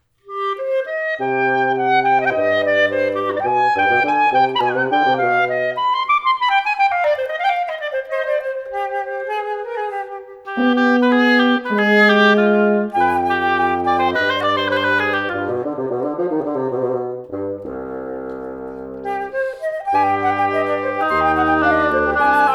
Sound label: wind quintet klezmer style